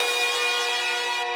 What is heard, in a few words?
synth main